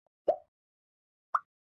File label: pop up